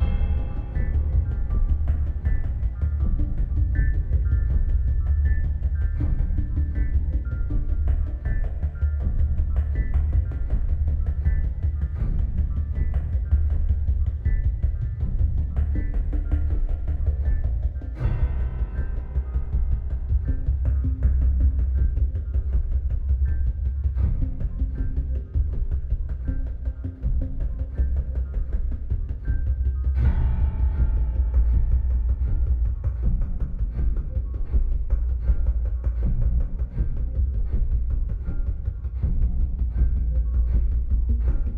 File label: cinematic no violins; Element: Space; PS4